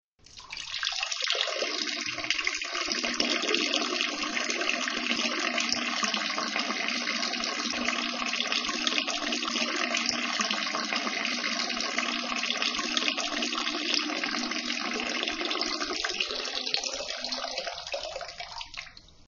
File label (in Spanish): meando; sonidos insoportables